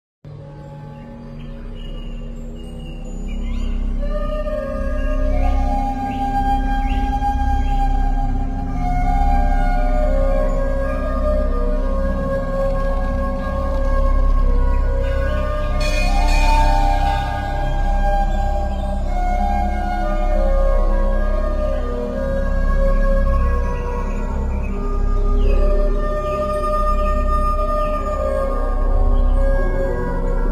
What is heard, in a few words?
piano instrumental music ringtone